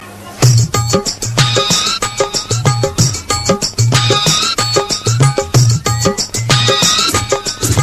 Folk Sms

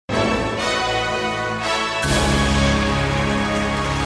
Melody Alert

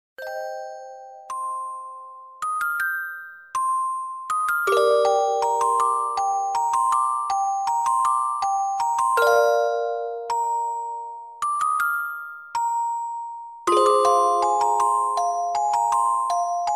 Music Box; GoT

